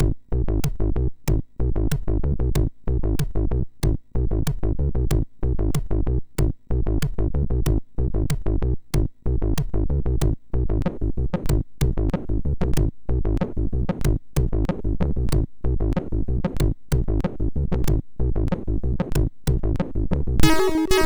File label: Techno, sid MOS6581R4